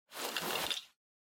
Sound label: Stopwatch Mechanical Pocket Tracksuit Take Out